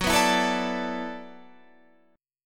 E 7th Suspended 2nd Suspended 4th, F sharp, chord

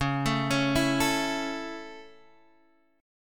A Major Db

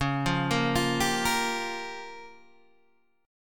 Db 6th Flat 5th Db, down, Guitar DADGAD

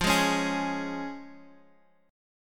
F Minor Sharp 5th, down

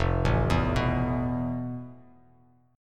F Major Sharp 11th, Bass Standard; arpeggiated; chord; FM#11; 1,0,2,4; JGuitar

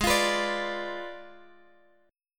Ab Diminished 7th Ab Guitar DADGAD

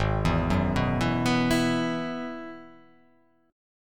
Ab Minor 7th Sharp 5th, chord; arpeggiated; 4,7,4,4,4,6,6,x

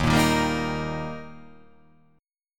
D sharp Major D sharp, chord; x,6,8,8,7,5,5,7; chordshape

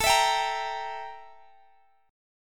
E Diminished

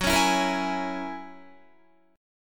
Gb 9th Gb down Guitar 4E,4A,5D,5G,5C,6F; Gb9 strummed

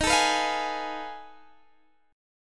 F 7th Sharp 9th Flat 5th Eb

